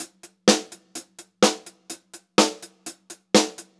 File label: Pop-Rock Drumloops; USB Soundscan vol.37; AKAI